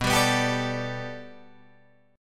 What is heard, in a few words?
B Minor 7th Sharp 5th B Guitar DADGAD, chord